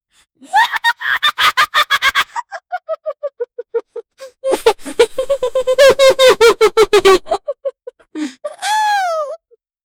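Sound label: short stifled maniacal giggle, villain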